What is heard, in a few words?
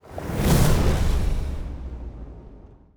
transport ship move; Stellaris; aquatics; ships